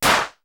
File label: DANCE SET, DRUMS